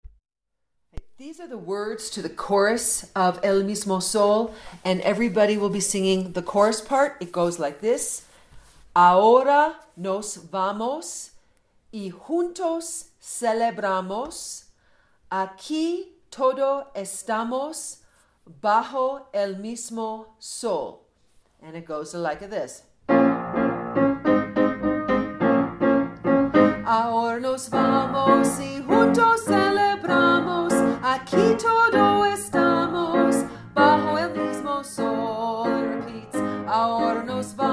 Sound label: chorus of in b flat minor